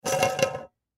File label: Jar Twist Lid, Jar Twist Lid Fast Glass; Foley; Food; Household; Blastwave